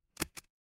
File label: office stapler